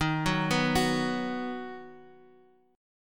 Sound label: D sharp Minor Sharp 5th D sharp; 8,6,7,9; 4G,5C,5E,5A; chordshape